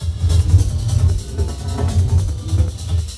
jungle

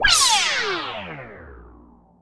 beam

